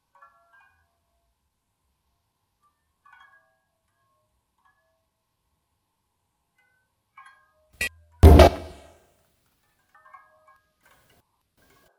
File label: percussion